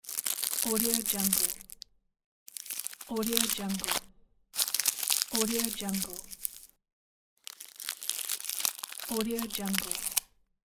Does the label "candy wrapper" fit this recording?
Yes